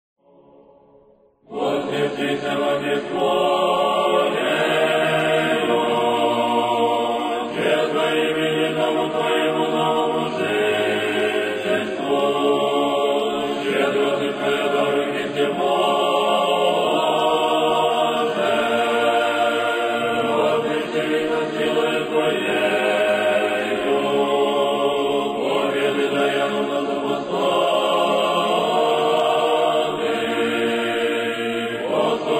czerkovnyj hor kondak vozdvizheniyu kresta gospodnya